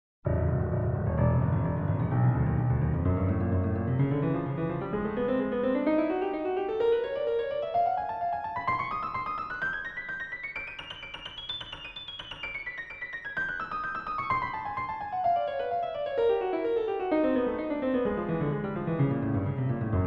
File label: scales